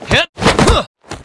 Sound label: Generic Kick